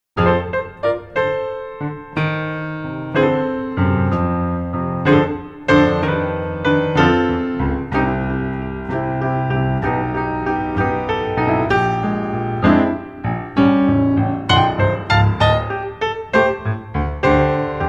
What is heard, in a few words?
Blues Improv